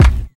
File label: bumpin kick c key, kickdrum sample tuned to the C note. Loudest frequency: 340Hz